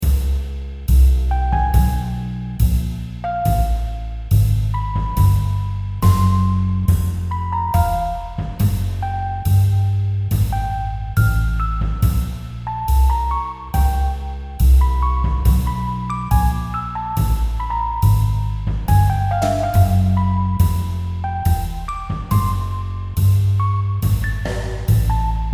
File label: casino